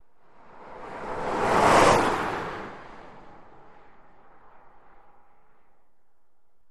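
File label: Car Medium Fast By Single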